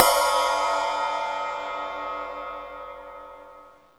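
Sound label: drums, Pure Electro; USB Soundscan vol.03; AKAI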